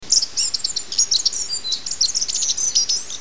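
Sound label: dark-sided flycatcher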